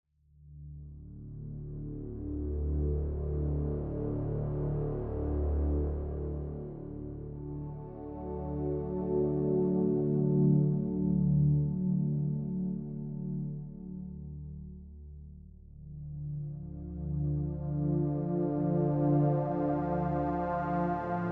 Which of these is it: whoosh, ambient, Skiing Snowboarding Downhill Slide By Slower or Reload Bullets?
ambient